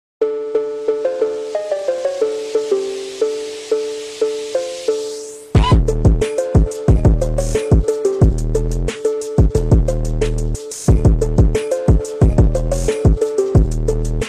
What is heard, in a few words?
Dirt Phonk